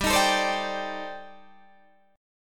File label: C Minor 6th Add 9th G Guitar Standard; Cm6add9/G strummed; JGuitar